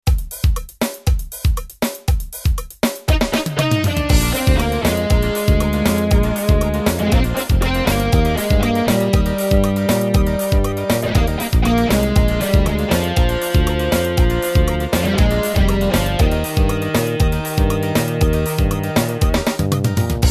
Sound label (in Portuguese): ritmos yamaha forrozão